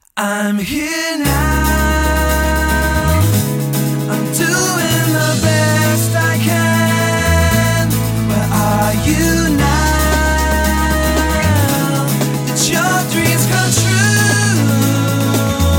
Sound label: sparking; tekken 5